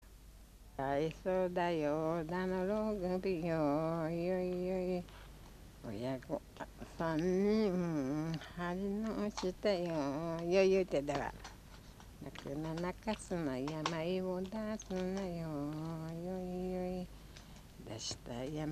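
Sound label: lullaby; minyou; komoriuta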